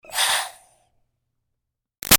Steam Release Iron Chuff Double; Miscellaneous Household; BigRoomSound

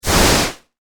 Science Fiction Flame Booster Short Burst

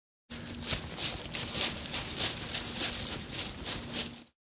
Leaf Run, Running through rustling fallen leaves.; Human

